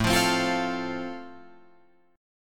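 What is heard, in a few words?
A Minor Sharp 5th, Guitar Open F, chord strum; Am#5 strummed; 9,12,12,12,12,12; JGuitar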